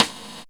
Gated Kit, Percussion

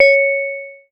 C#, BELL GONG, Synclav FM; Big Fish Audio

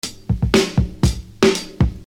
Beat, Hip-Hop Street Beats

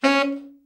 TENOR SN, Sax; TENOR SHORT; Giga Samples Collection

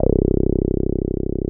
TB 303

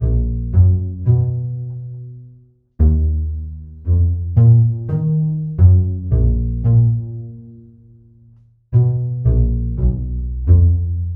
Upright Bass, Cinematic Funk; Sonic Foundry (Sony Creative Software); Cinematic Funk Urban Tone Poems

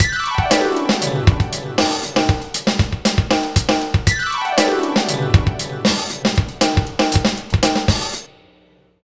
Gliss 2fer Full, lounge, 118; Zero-G Kocktail Kollection; Construction Kitz (Lounge); Gliss 2Fers Mixes 118

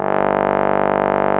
BRASS G1, HORN

